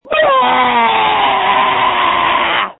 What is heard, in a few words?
Scream